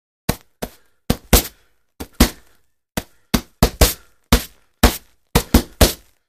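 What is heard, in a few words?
Boxing Gloves Hitting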